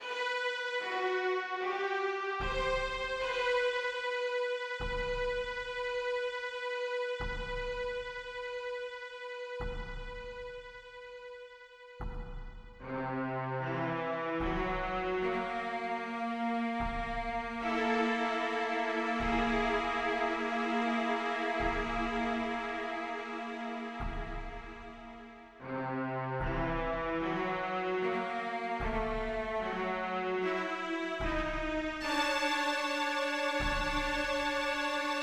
horror; FF7DEMO

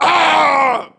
male hit

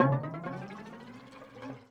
ethno 125

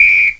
whis

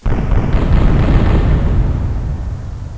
FIRE

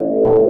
EVACUA

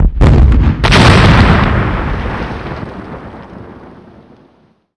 impact explosion largest; Earth and Beyond; EA Games

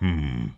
hmm

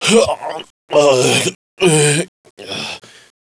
death; SINDEMO; player; blade

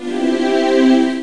key; Museum of Anything Goes

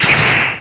blast

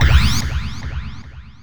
in the lead, pling; Ballist

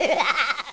lose

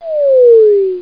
lost all

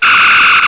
GOOP

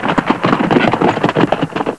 Gallop; dragoon

